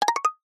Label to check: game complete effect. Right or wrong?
Wrong